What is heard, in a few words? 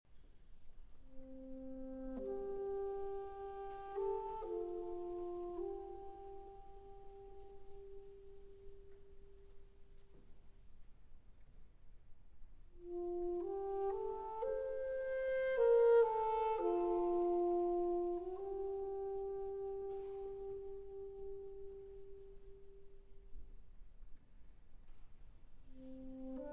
Double Concerto For Alto Saxophone, Percussion and Orchestra